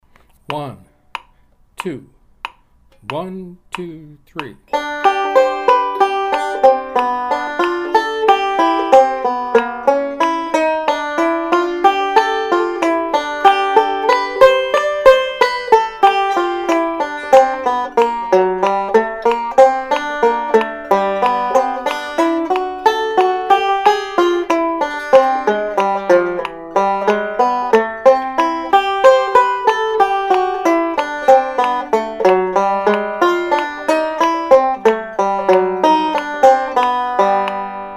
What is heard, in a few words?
Jazz Blues 8th study